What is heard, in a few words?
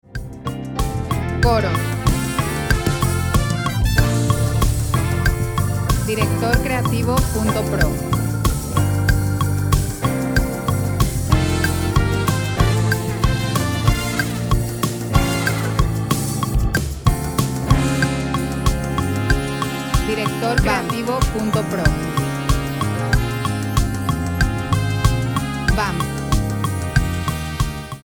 4 4 90bpm Dm